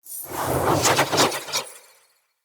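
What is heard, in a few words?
Staff Caster Projectile Whizz By Heavy; Weapons; Player; Staves; Starter Staff Caster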